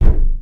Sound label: wood crate impact soft, physics